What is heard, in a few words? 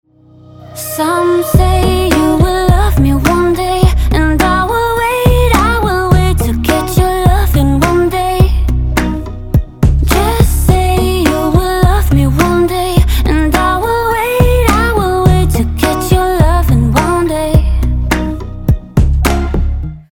BEAT